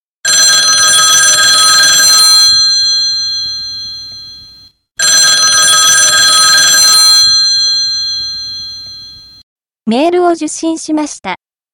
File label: ring mail